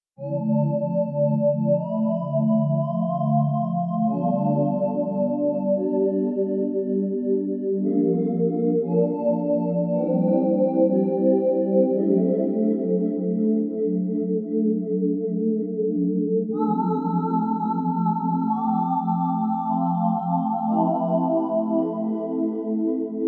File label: Xtal Shimmer, Korg Radias-R